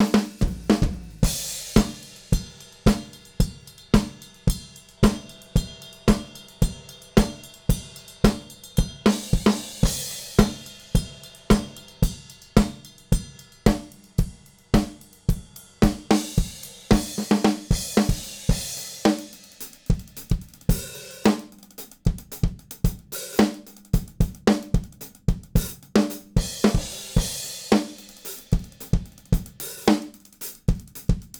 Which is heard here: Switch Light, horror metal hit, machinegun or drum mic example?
drum mic example